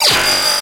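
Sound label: error sound; zvuk oshibki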